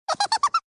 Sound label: hehehehe kid